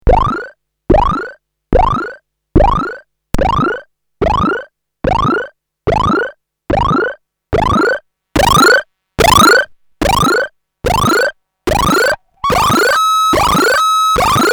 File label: synth